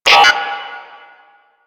Radio Click; Kahl; Warframe; The New War; Grineer Eidolon

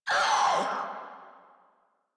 Infested Crawler Pain; Warframe

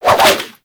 Sword And Whip Spin Whoosh; Warframe

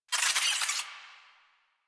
Space Drone Death; Warframe; Corpus Space Drone

